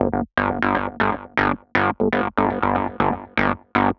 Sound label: Delay Bass; Sonic Foundry (Sony Creative Software); The Electronic Music Manuscript Collection; Bass Foundations